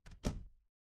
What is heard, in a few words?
door close